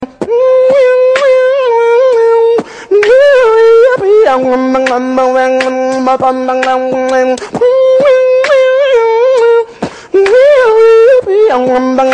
Indian Beatbox

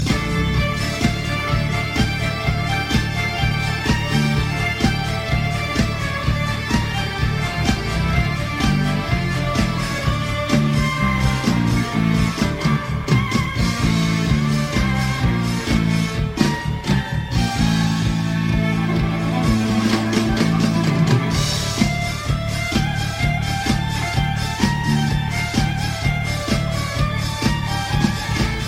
Toccata

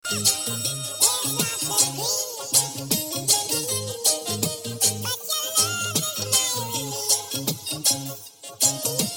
Nokia Mouse Sing

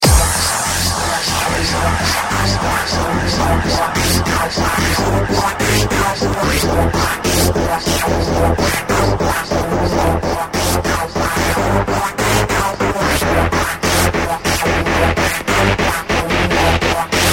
Hard House; Hard House Bd

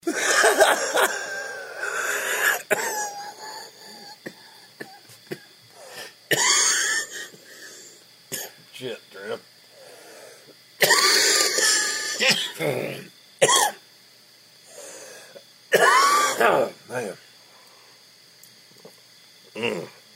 smoker laugh cough

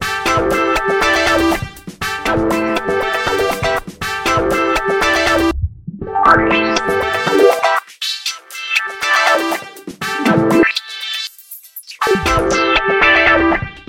grooves

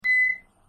Otis 3 Beeps; Otis Series

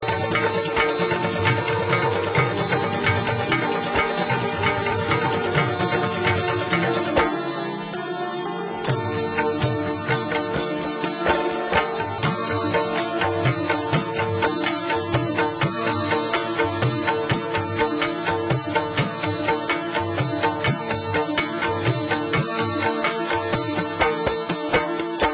Sitar Rhythm

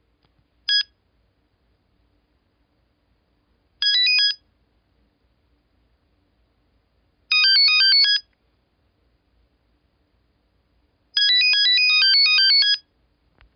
LG Crescendo

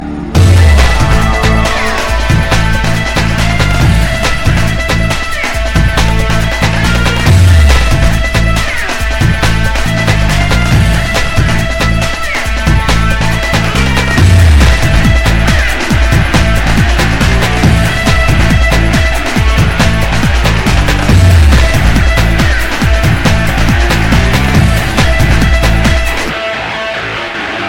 Great Electronica

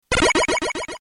Teleport; Megaman

